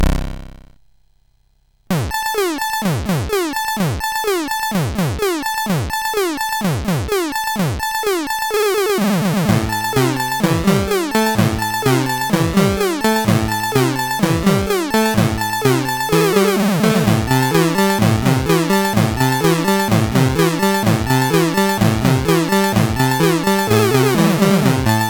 Funky Song, sid MOS6581R3

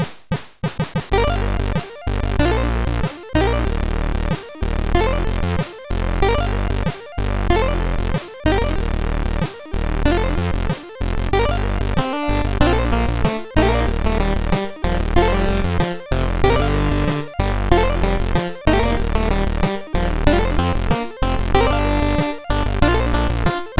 Relaxation, MOS6581R3 sid

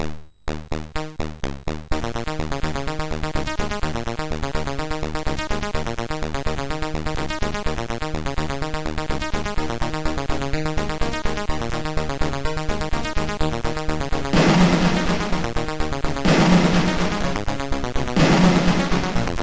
Intromusic, MOS6581R3

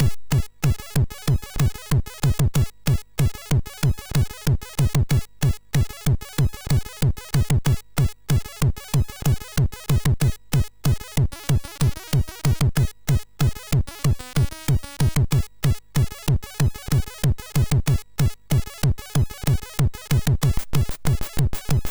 Hardcore Tekkno, MOS6581R3

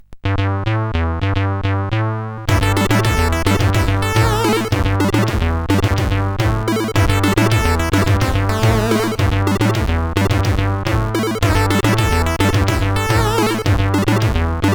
Samba, sid, CSG8580R5; Bolognese

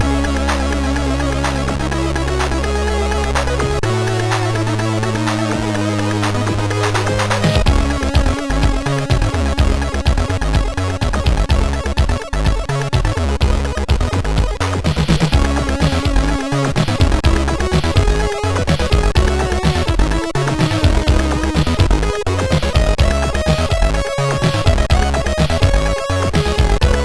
Digi Test sid MOS6581R2

